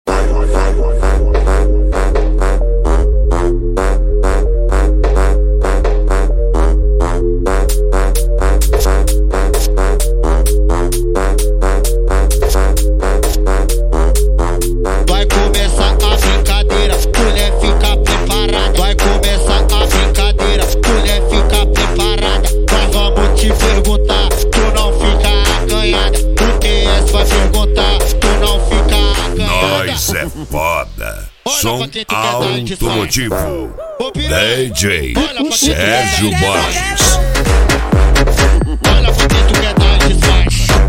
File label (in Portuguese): eletrofunk; som automotivo